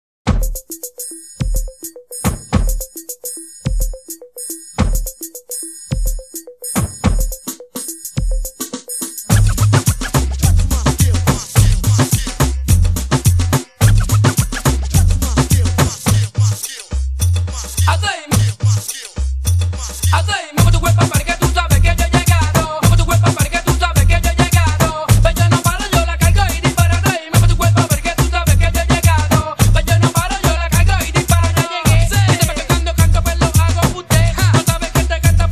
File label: Reggaeton